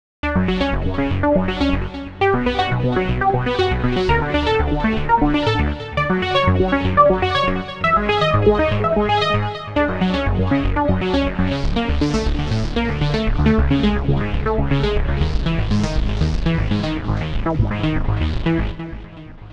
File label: trance and dance